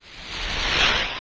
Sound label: Phantom Ganon Eye Glow; OOT